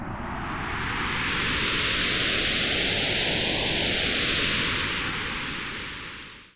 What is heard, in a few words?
noise fx; Masterbits; Switch FX